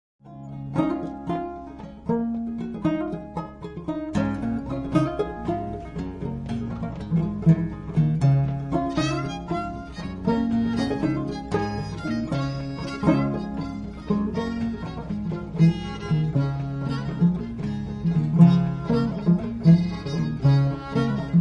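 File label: double shuffle, banjo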